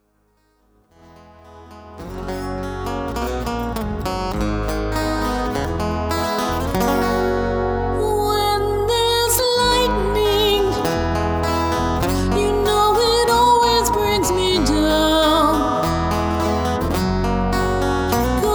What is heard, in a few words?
Acoustic